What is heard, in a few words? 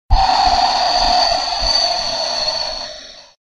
Balloon; water animal